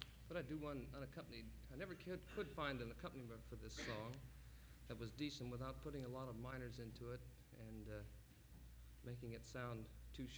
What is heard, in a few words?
Applause